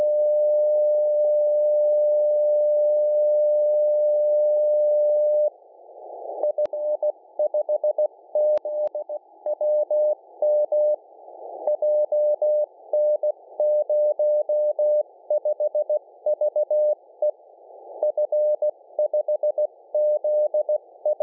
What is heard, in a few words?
432922kHz AF; HDSDR; balise10ghz